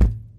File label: wood solid impact soft; physics